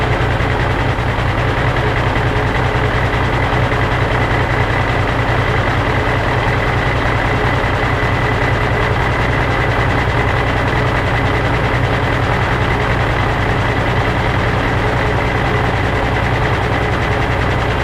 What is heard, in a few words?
MOTOR IDLE; SIMUZENSOUND